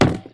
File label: thick plastic hard